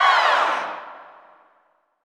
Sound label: CHO FALL, CHO Falls